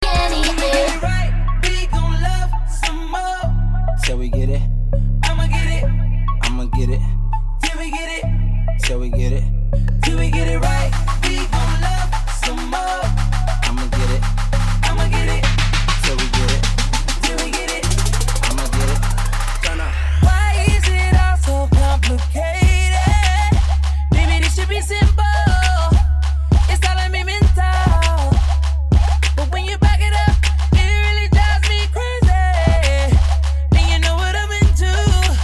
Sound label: funk; multitrax